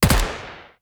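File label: assault rifle, impact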